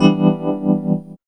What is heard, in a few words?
RHODES